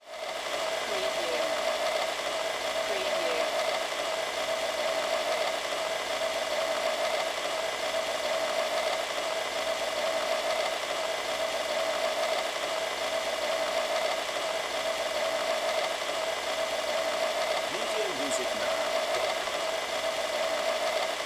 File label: Conveyor Belt; Industrial / Machinery